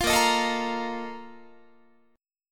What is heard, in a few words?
A sharp Major 7th Suspended 4th, Guitar; A#M7sus4; strummed